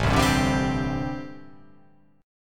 A Minor 11th A, Chord

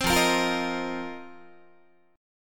F 7th Suspended 4th F down Guitar; strummed

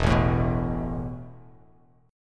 E Suspended 4th E, down, Bass Standard; Esus4 strummed

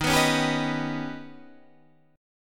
D sharp Augmented 7th D sharp, guitar

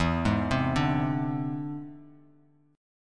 E Augmented 7th E down Bass Standard; E+7 arpeggiated; JGuitar

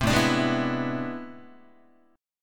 A-Minor Major 11th Guitar, AmM11 chord; strummed; JGuitar

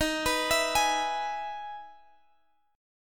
E Major 7th Eb

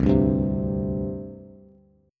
C Suspended 2nd, C, down, Bass; Csus2 strummed

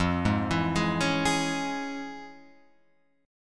F Minor Major 7th Sharp 5th Guitar Open F; arpeggiated; JGuitar

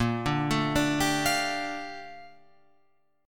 A sharp Major 13th A sharp Guitar Open F; A#M13; arpeggiated; JGuitar

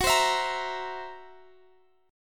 C 7th Flat 5th, F sharp

